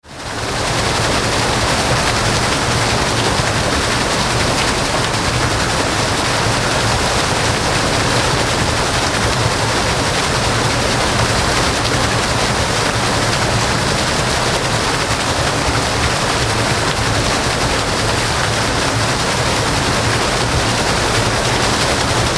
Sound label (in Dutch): molen